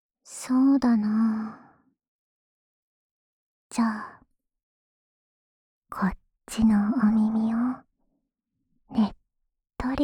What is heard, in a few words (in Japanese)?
ダウナー ねっとり左耳フェラ, ASMR